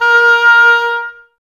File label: OBOES A#, Woodwind Brass Ensemble; Miroslav Vitous